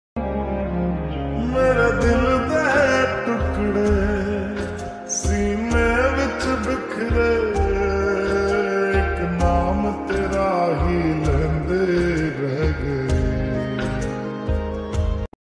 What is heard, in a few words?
mood off ringtone broken heart ringtone sad ringtone Hindi